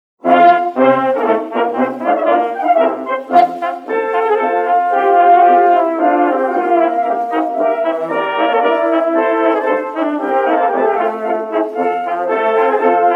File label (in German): MARSCH